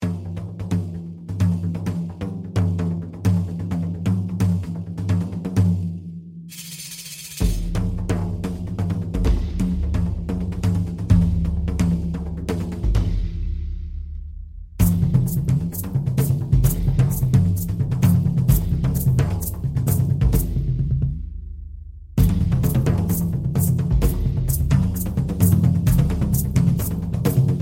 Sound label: riq drum; soundiron; riq drum v2.0; kontakt